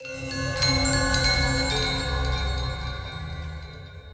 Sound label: gamble mouseover; Butterfly Lovers; wazdan